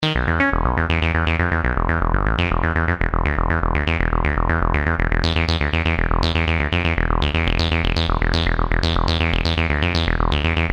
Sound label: ACID SEQ.; Best Service Alpha Dance II